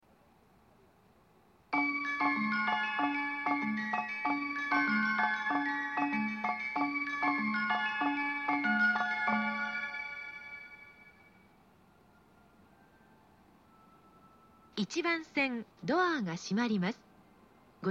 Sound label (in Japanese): shinjuku hassya; 発車放送; 埼京線南行(渋谷・大崎・りんかい線方面); 湘南新宿ライン南行(大崎・横浜・逗子・小田原方面); 1番線